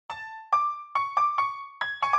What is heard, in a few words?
Hippo Instrumental